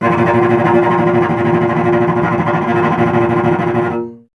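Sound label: strings cello A#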